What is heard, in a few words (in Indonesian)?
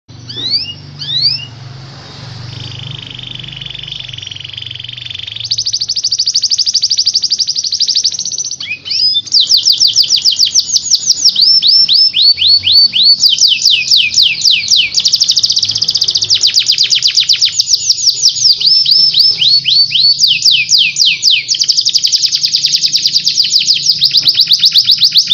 kenari gacor ngeriwik; masteran